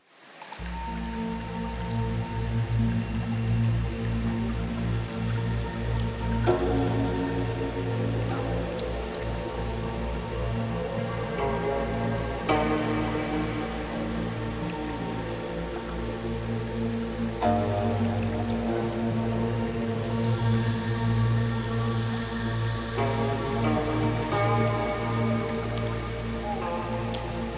Chinese Meditation

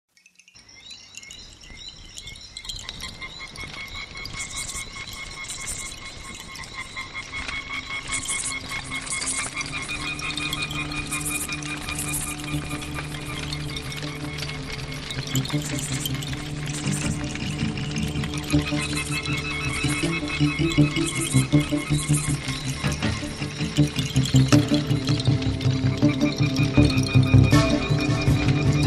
ambient with nature; odwieczna modlitwa

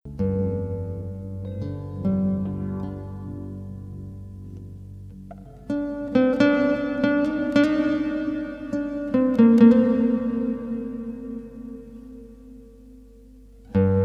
chillout, 432 hz; pomylka; Pomyłka

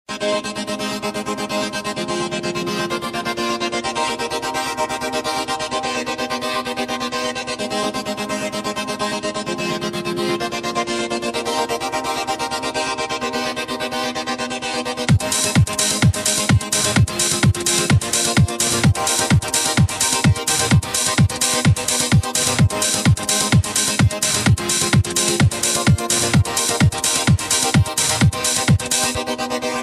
Funky Beats, Kolekcja utworów techno

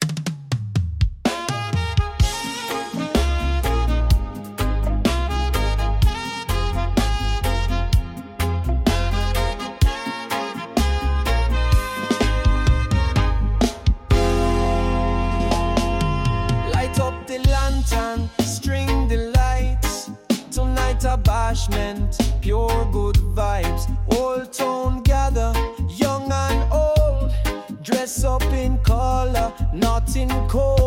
Reggae Ball Tonight

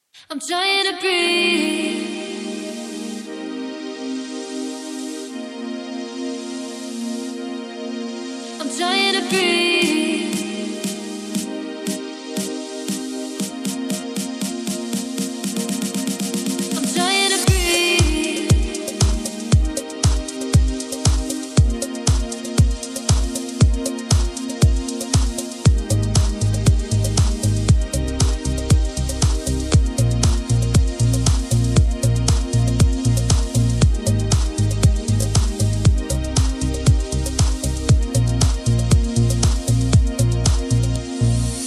happy music

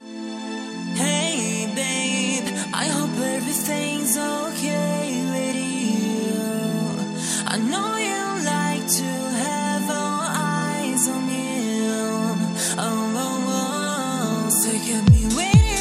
disco music 432 Hz

